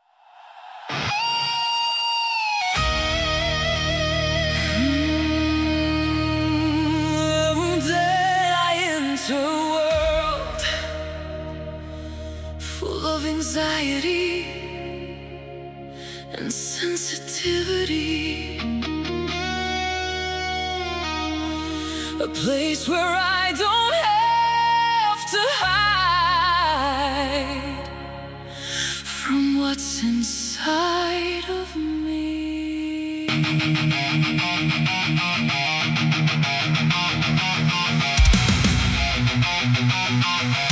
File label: when I played my guitar